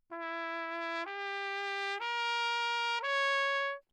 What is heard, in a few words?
TRP MUTE Bach Crown Ku; Perinétventiltrompete; Mutes